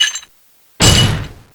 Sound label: heavy slam; blackquill